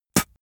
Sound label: spit; soundpool